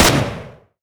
respawn turret fire

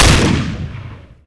super shotgun fire; weapons

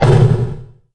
jump pad fire

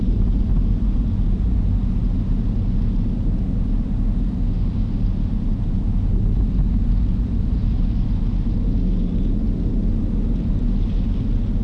wasteland wind; ambient

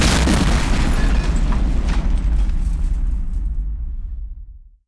detpack explode

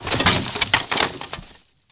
debris, grenades; Frag

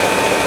assault cannon rotate; weapons

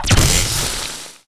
rail body, bullets; weapons

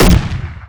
rpg fire; weapons

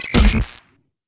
medkit hit; weapons

